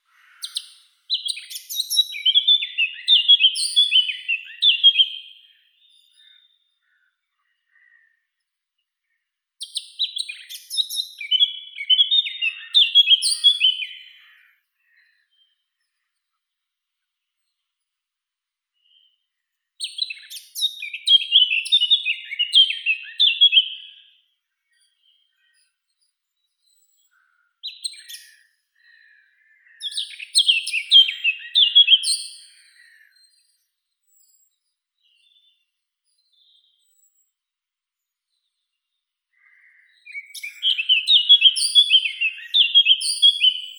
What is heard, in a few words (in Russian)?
slavka chernogolovka sylvia atricapilla, zvuki prirodi